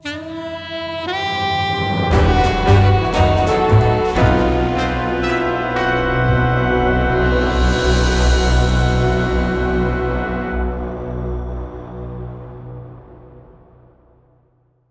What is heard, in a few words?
Round Start (Short); Shadows of Evil